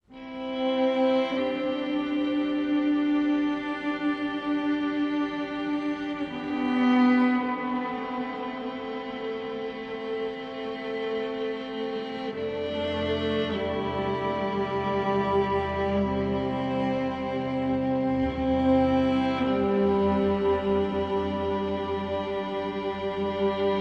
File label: Strings and Pads